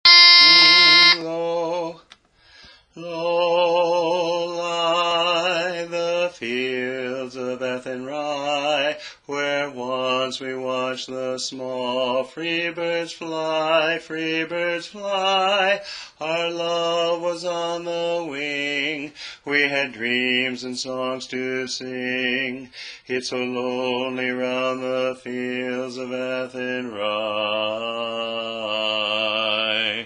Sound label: Tenor-Low